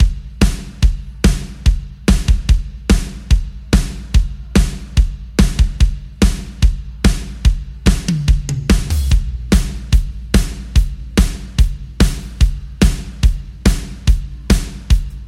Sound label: Drums